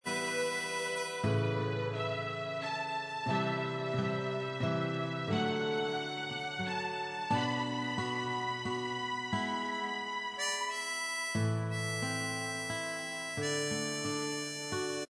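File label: instrumental, track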